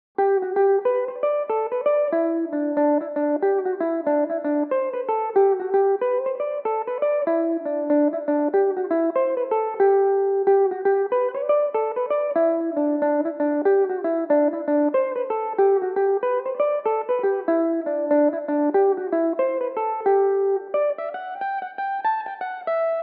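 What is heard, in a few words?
G major, mandolin; Belmuse EM-190 electric mandolin; Zouki's Prayer Box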